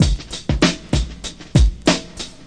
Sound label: classic brk; DRUM LOOPS and BREAKS; VIBRIS CLASSIC BREAKS